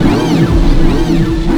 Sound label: FX, Futurist Drum & Bass